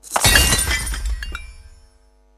piggybank break; Candy Crush Friends

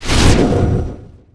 SKILL STAFF COSMIC ELEMENT; Fly For Insanity

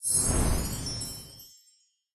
piggybank success popup; Candy Crush Friends

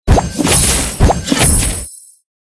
striped wrapped combo; Candy Crush Friends

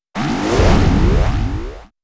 Ice shark; Fly For Insanity

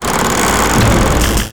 Driller die; FlyForInsanity

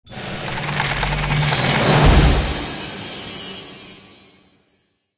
rankup; Candy Crush Saga; seniority

